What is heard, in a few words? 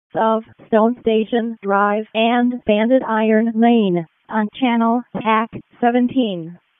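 ALERT, radio; RFD; wake; p25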